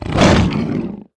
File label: devour; balathron; svencoop